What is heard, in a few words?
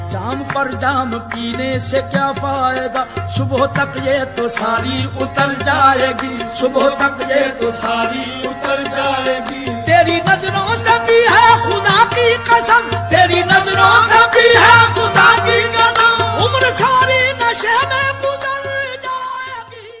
Tik Tok Song Ringtone